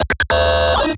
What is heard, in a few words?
8Bit Fx; Antidote Advent